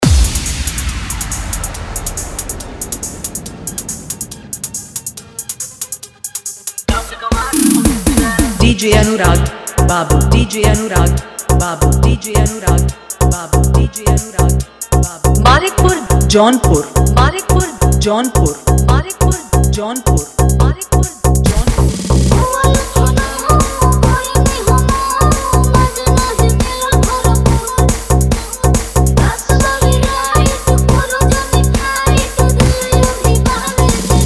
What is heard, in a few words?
Fully Dhollki Bass; Ros Dance Mix